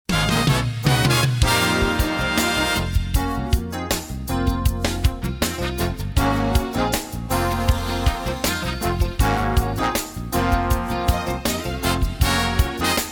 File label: Jinglebells